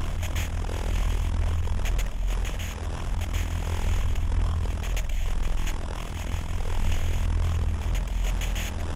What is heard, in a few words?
Portal Glitch; game audio; Next Version; MiSide